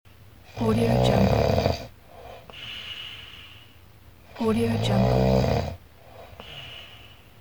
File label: snoring loop